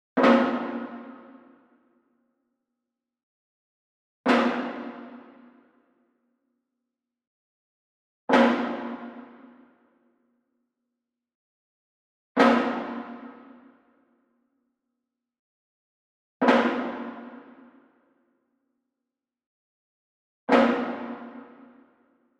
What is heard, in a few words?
Wood Percussion Wood Drum Floor Flam Beater Far; boom library; Cinematic Strikes Construction Kit